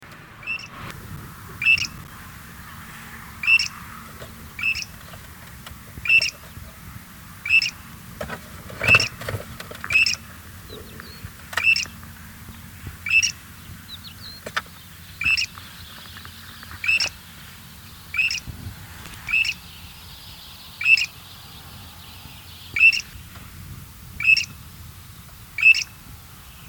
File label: Arabian Grey Shrike; Oshayqer Saudi Arabia; AbdulRahman Al-Sirhan